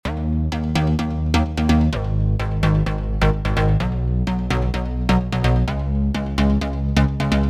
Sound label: Bass edm Spire